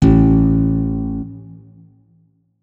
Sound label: notes, guitar; Hideout Furniture